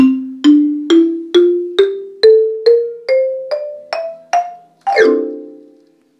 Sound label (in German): Xylophon